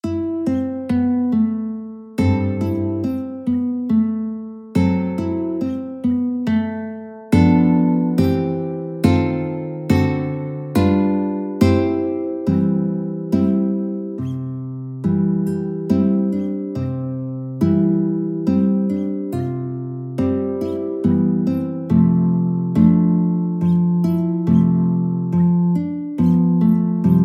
theme, guitar; piano

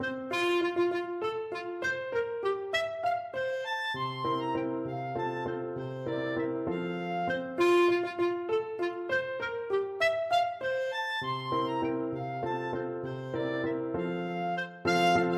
der postillon, clarinet

